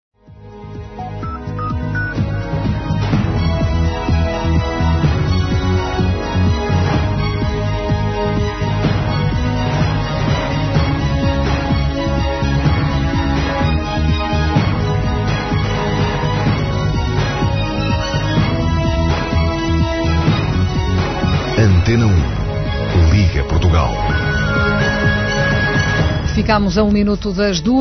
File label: Interval signal; por rdp1